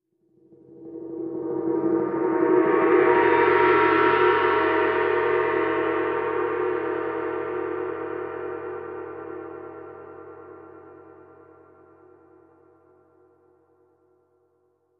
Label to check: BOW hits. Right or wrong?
Wrong